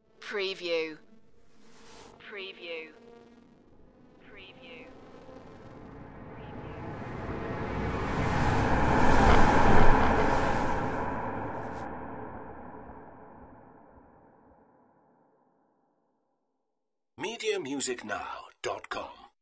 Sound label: power glitch flyby